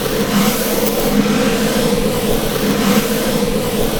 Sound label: Cone Stn Slide Lp; moul